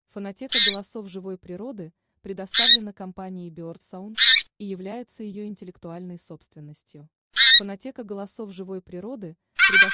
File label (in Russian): Гуменник; OCA ZAMPE ROSSE